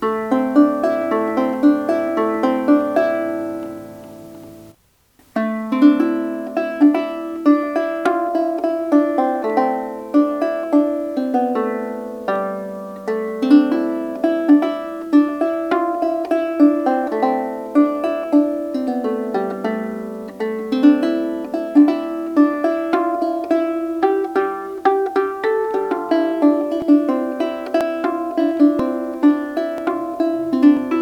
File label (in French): lyre chevalet ambre